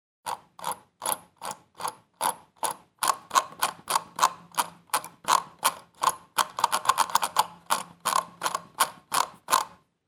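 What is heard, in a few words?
sound of mouse wheel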